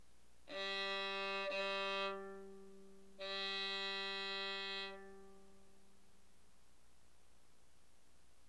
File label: violin G